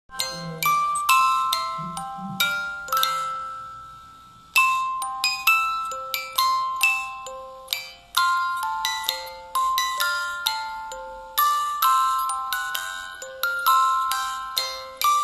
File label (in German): Spieluhr